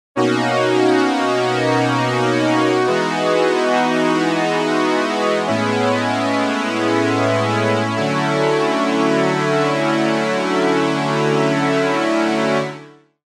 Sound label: omni jazz chords stereo; stringer